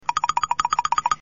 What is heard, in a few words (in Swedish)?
Klicketiklicketiklick